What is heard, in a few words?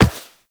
Operator Walk Metal, Footsteps; Warframe